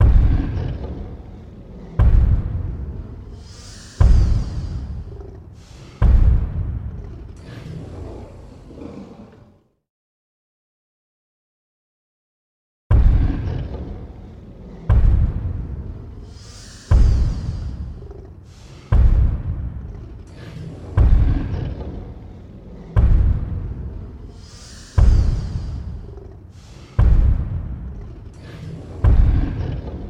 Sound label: Dinosaur Footstep; audio hero